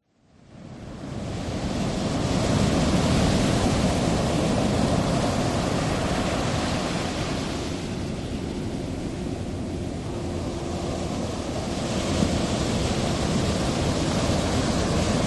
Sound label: Ocean Waves Sound